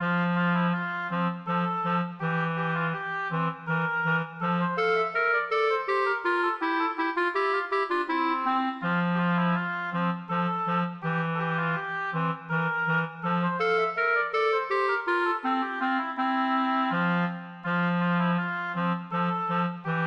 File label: clarinet-oboe duet